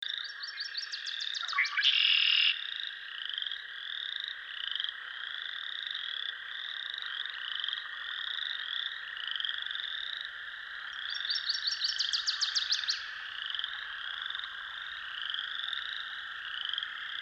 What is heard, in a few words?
Northern Waterthrush